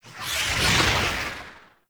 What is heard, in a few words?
Bullet Jump Whoosh, Motorcycle; Warframe; Motorcycle Ninety Nine